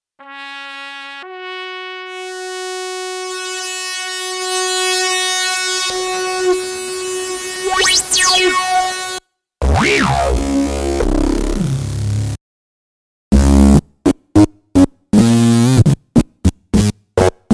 nord wave rumpet

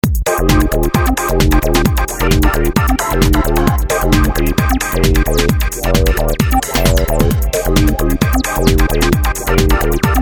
EMX Techno

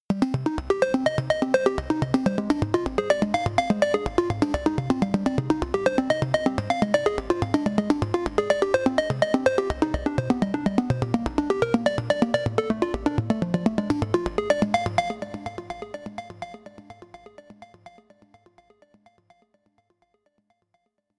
Metal Arp

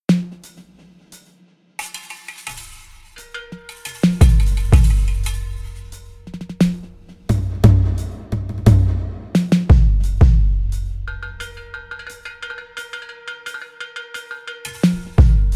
8bk

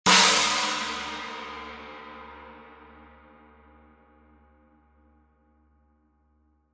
CH, CHINA

